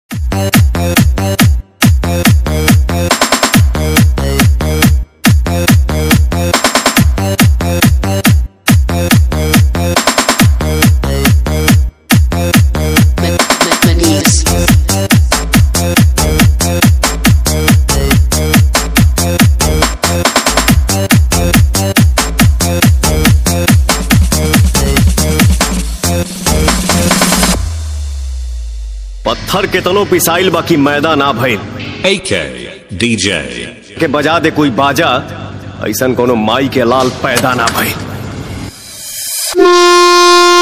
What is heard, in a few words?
Train Horn Beat High Vibration (Super Dialogue Mix)